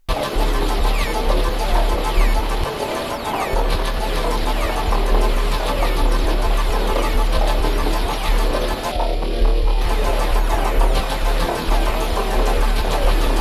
noise holes, synth; ancient history by modern standards

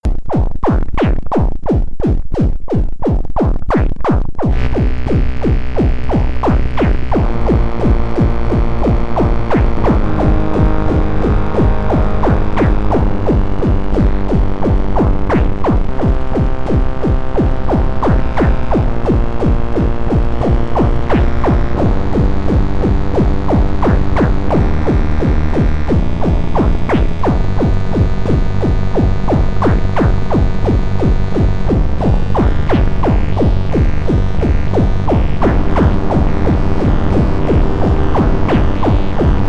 pong, synth; songs of insects

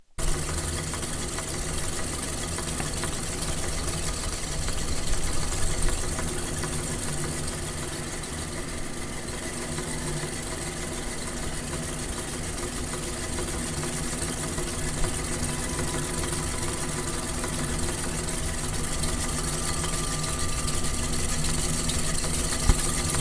whirling machine

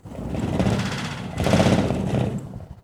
rambling roof

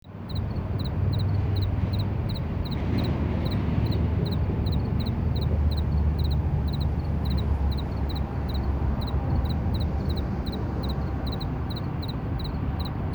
fast crickets

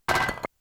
bricks falling

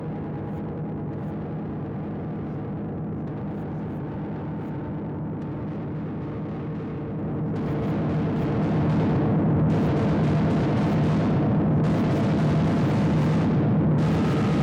crunchy, synth

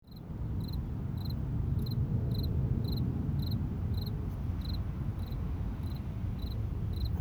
highway cricket; crickets

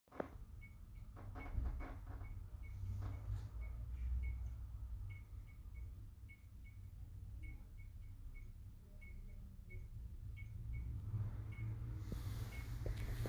flourescent light click

